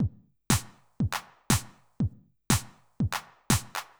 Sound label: House Drum NoHH Reverb